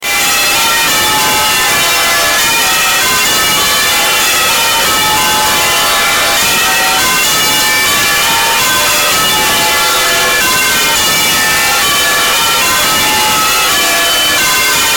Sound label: the bells ringing in the bell tower at st michael's camberely